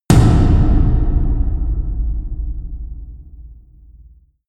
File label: huge tom hits; music elements; percussion